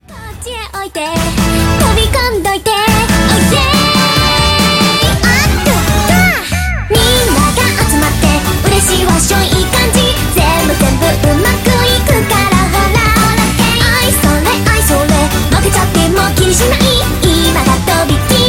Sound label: Polka; Odoru